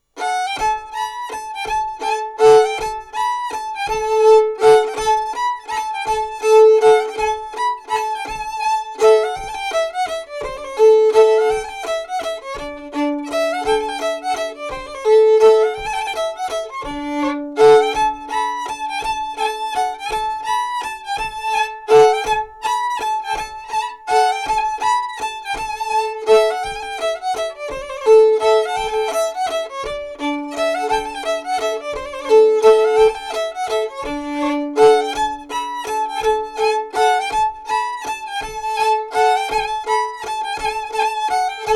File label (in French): Mazurka; Mazurka de Siccard